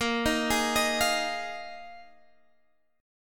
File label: Bb 7th Suspended 4th Bb